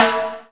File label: timbale h